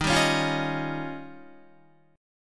D sharp 9th, Guitar; D#9; strummed

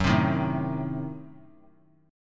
F sharp Diminished 7th F sharp; strummed; JGuitar

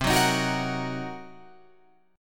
C Minor 7th Flat 5th C down Guitar Open F; Cm7b5 strummed; JGuitar

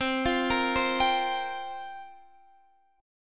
C 7th Suspended 4th, Guitar, Standard; C7sus4 arpeggiated

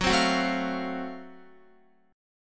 Gb Minor Major 7th Flat 5th, Guitar, chord; strummed; x,9,10,11,9,12; JGuitar

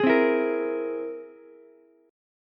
C Augmented 7th, Ukulele; strummed; JGuitar

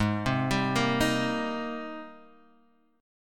Ab Major 9th Ab Guitar DADGAD

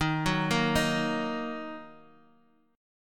D sharp Minor, Guitar, Half Step Down

